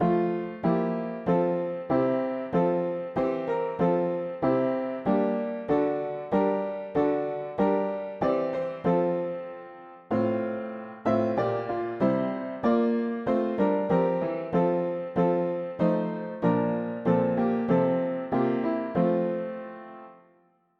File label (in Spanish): mono piano; hymns; Himnario pequeno